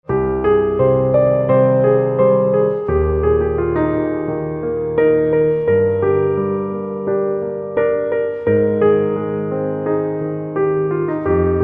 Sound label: Piano